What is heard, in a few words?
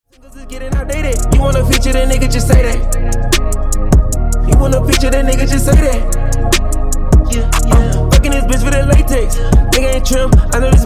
75bpm; Redrum